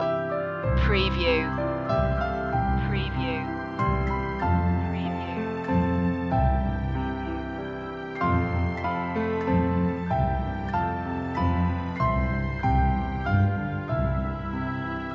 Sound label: orchestral loop; the first noel; media music now